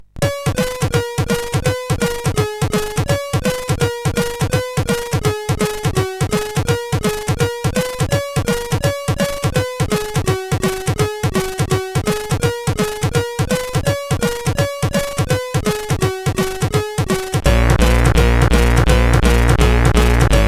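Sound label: Xmas Rock, MOS8580R5 sid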